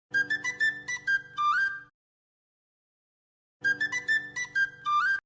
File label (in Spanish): flauta; bob esponja